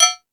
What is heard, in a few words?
Space Drums, Drum Machines